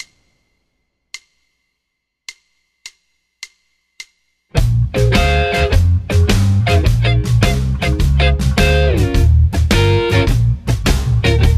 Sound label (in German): Dominanten tenor